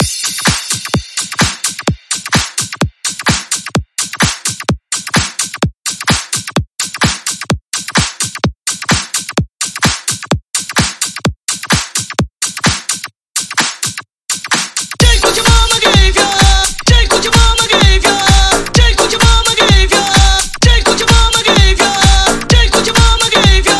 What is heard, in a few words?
House Aca Out